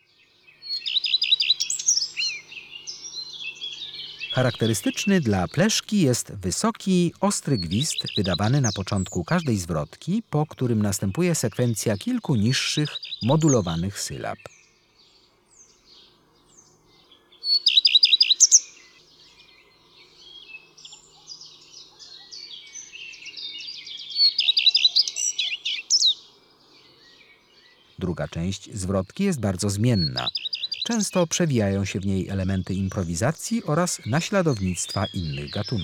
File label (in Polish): Pleszka, Ptaki; Kurs PTTK; Przyroda